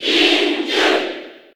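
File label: Cheer Japanese, crowd; Link; SSB4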